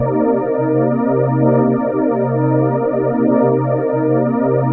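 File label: soundtrack, ambient atmospheres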